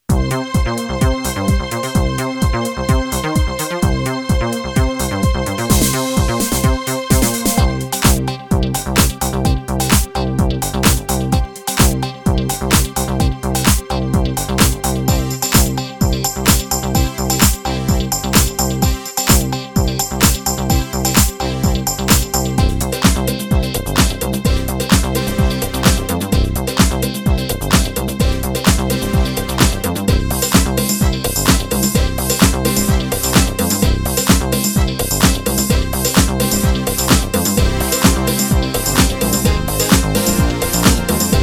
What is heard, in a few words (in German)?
Style Demo Disco analog, Intro 1, Var 1-4, Ending 4; VSP-2; VIVA MUSICA Fachhandel